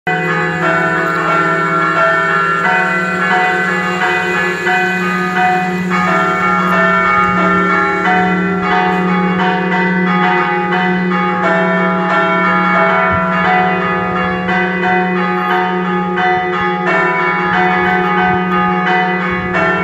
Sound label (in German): Beiern; Dionysius